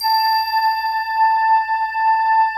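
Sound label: A4 SYN; Synclavier Sampler Library; KEYS AND GUITARS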